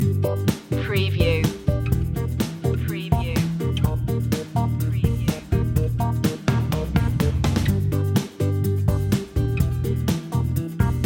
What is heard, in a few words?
got game loop